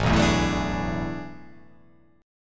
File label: A Major 7th Suspended 2nd Suspended 4th